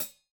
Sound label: smooth hat sound sample e key